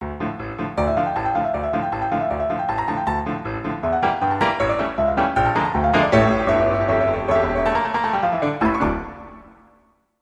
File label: Sting